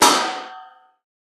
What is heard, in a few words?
bullet impact metal